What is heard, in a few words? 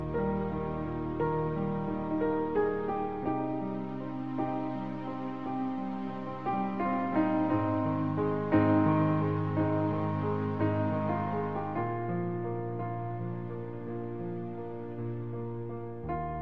classical piano